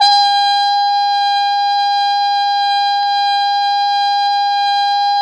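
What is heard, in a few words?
Plunger Mute, Brass; Roland L-CD702; Plunger & Wa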